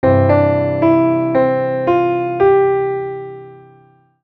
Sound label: Melody in C